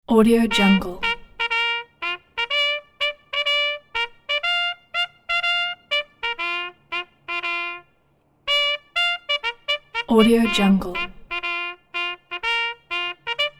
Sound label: tattoo bugle call; sound ideas